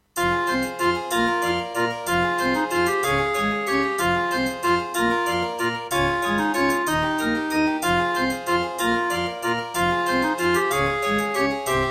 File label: Roland SC-7; Death Gate